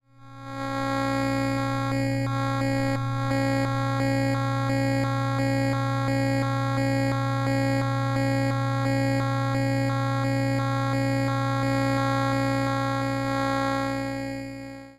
CD player fluorescent display

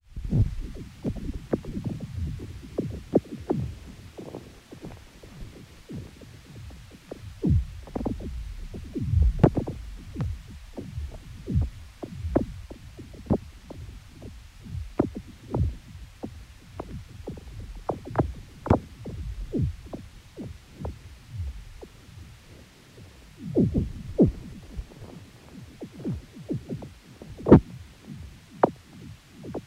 sun reflection lake oscillations

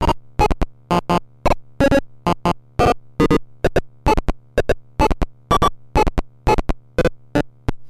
IR remote

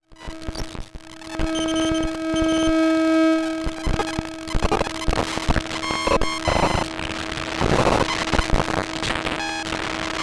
disk activity light

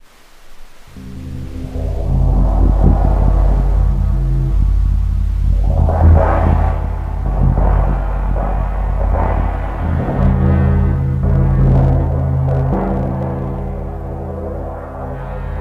sun reflection Paiste 2002 crash 16

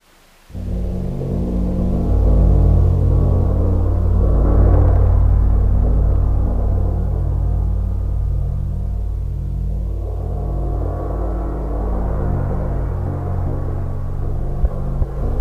sun reflection Zildjian Avedis ride 22

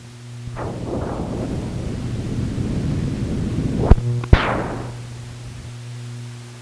headlight turbulence

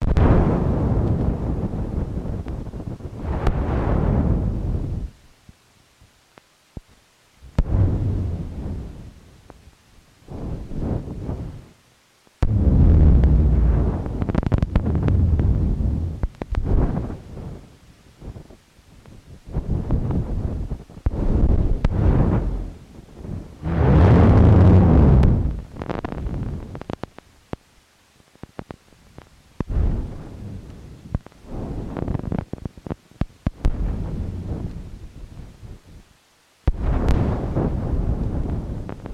collage fireworks

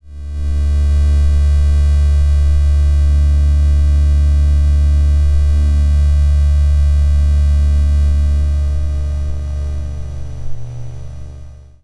phone screen

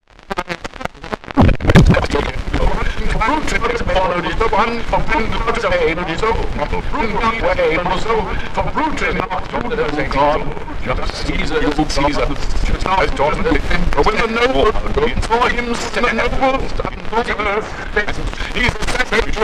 laser vinyl reflection 33rpm speech

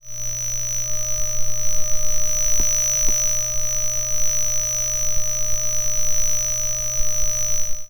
phone screen

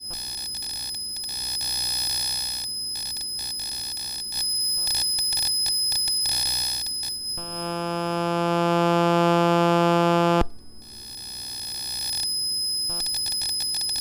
optical mouse

